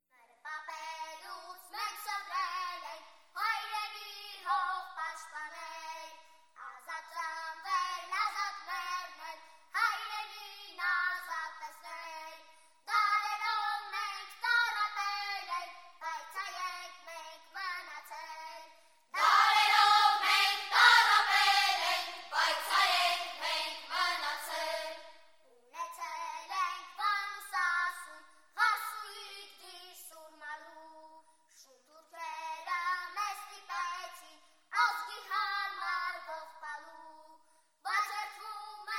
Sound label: Armenian Patriotic Song